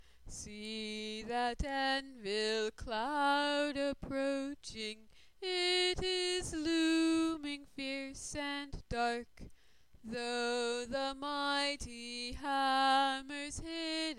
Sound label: thunderstorm hymn